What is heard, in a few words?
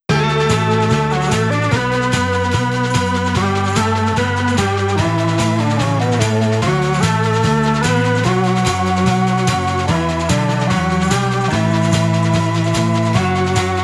Helmet Bump Rock; Hardspace: Shipbreaker